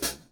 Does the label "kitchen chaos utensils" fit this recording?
No